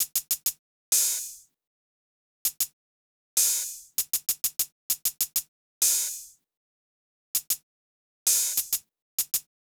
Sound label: Hats, Drum Tracks, Amin; Hip Hop; big fish audio; Hip Hop Exotica; Apple Loops